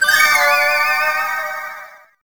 Love Jingle; Contact; Nintendo DS

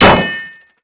Panel Shower Hit; Among Us

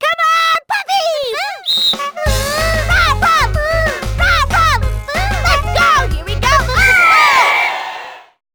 Fight Cheer (English)

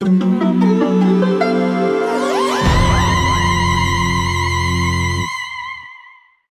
Goal Fanfare; crash bandicoot series sound box; Uranus' Mine; 4th8th Place

